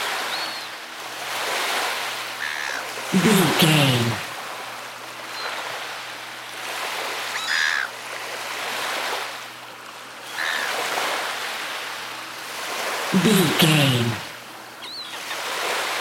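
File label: beach waves seagulls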